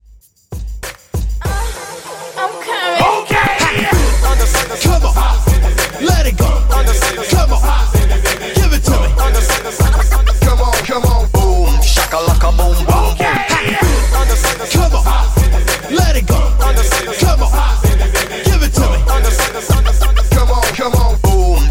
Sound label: Scratch Re Drum 97